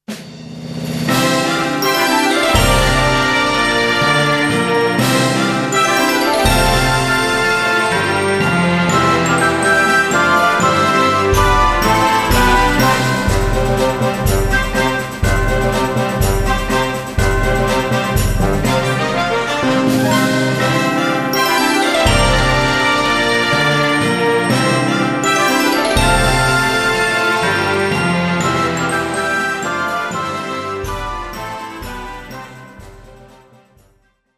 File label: fanfare